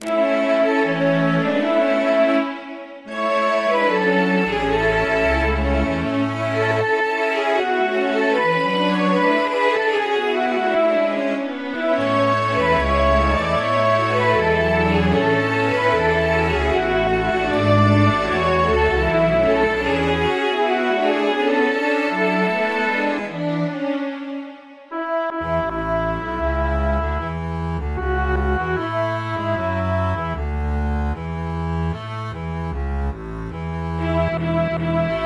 ensemble

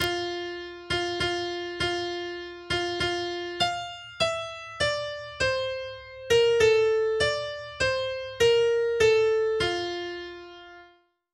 Whittington chimes

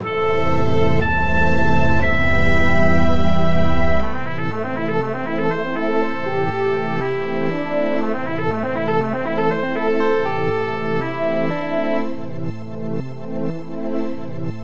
Video Game Music

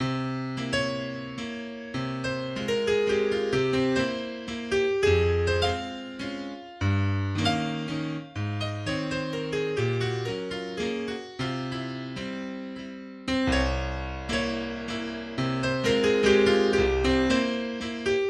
in F Minor Lento; Mazurka No40; Opus 63 No2

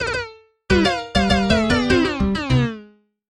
Death

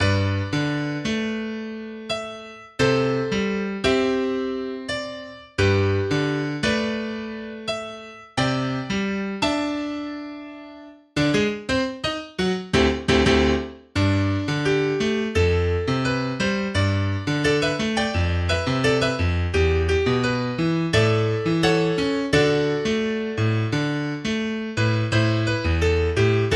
Piano; Fourside

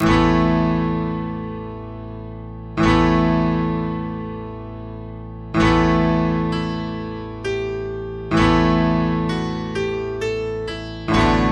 Quiet Water

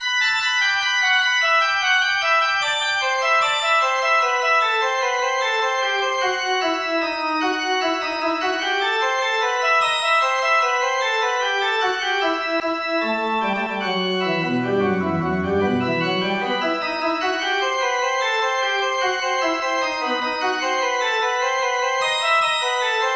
Echo Etude

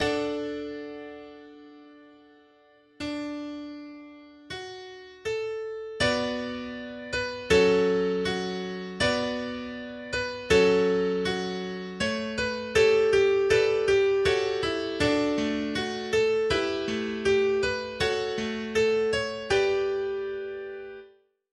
Day and Polka; Once a Year

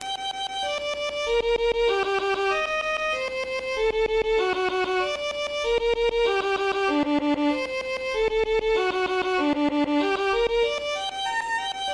Violin Solo

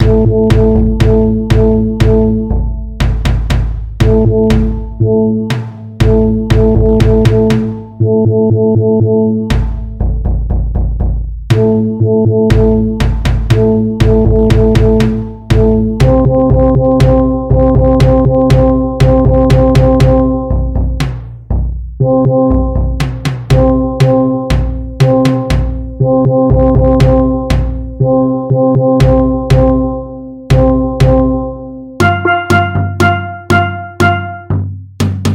drums

